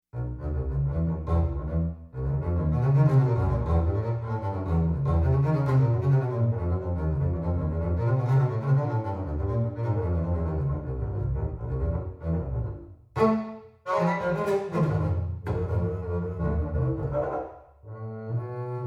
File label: C Bass Solo